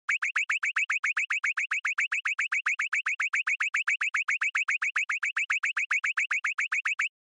Onoma Panic Slow

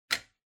Wall Outlet Wall Unlock

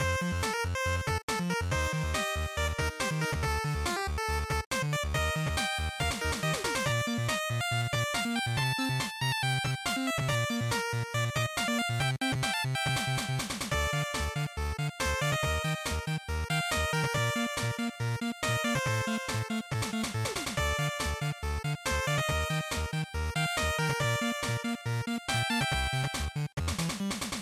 GB Action Title Loop140

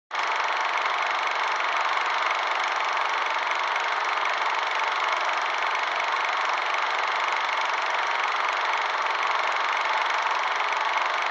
Movie Projector

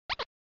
OS Sound Notification

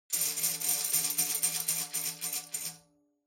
Tambourine Roll

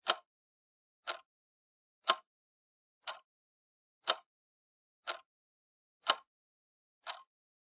Clock Second Hand Dry Loop

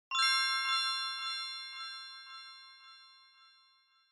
Bell Accent High Delay

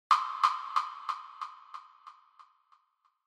Woodblock, Delay Mid

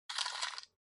OS Sound Speaker Recycle

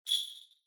Ring Bells Dry Knock

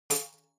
Tambourine Rim Mute

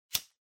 Lighter Flick

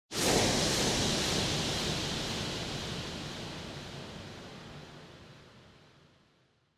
Motion Speed Lines Delay

